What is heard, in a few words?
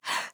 Breath